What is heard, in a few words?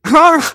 scout pain sharp